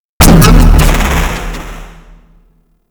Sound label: Stairway Augment Burst; Warframe; Paladin